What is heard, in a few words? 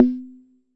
Jomox MBrane, drum machines; Jomox MBrane 11